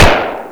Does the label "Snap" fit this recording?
No